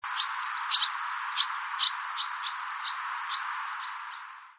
tsubame

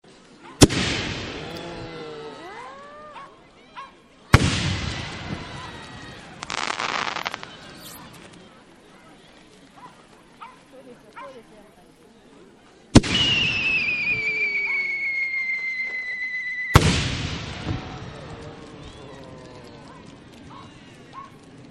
hanabi

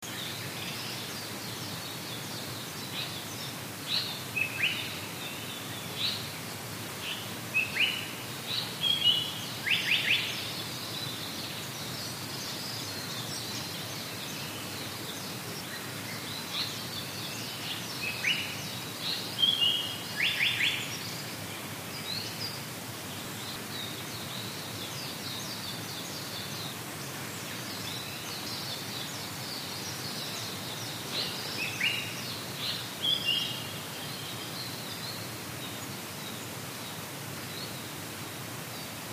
sanko

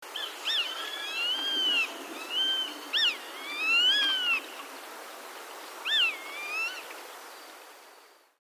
sashiba

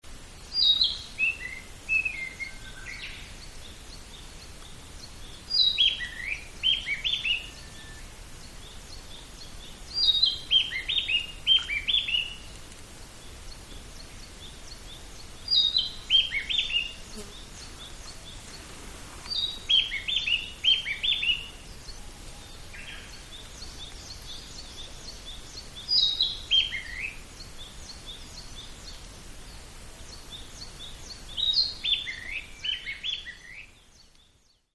kibita

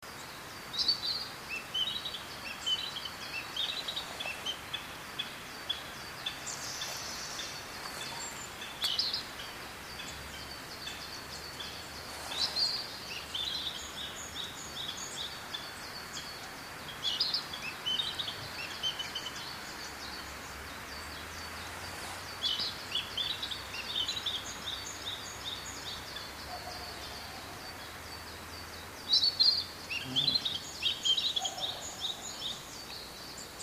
kibita

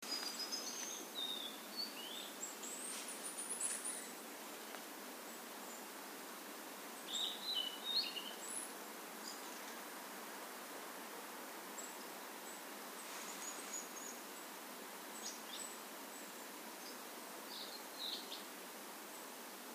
oruri

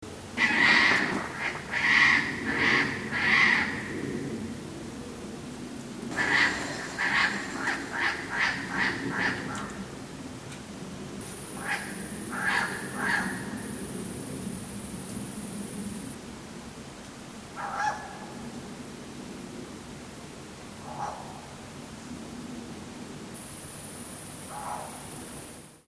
fukuro

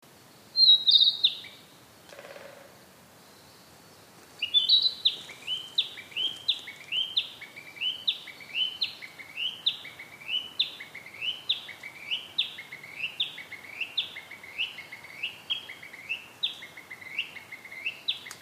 kibita

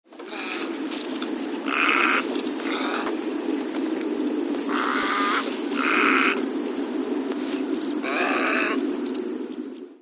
kosagi

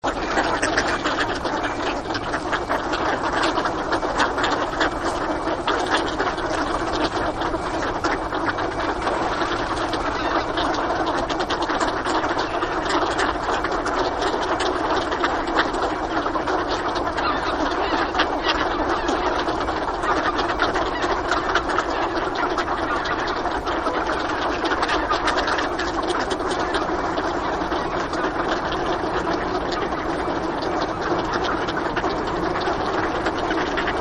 onagagamo

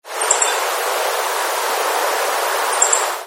uguis

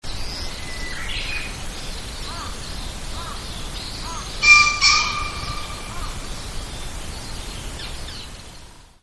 kiji

